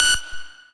lead

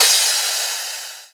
crash

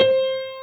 jazz C5 Half note

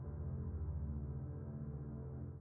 chord

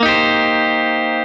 clean Arpeggio down Asus4

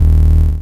Bass; osu

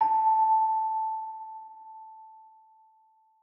Bell high A

